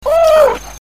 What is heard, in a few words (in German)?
mutter hilflos, Geparden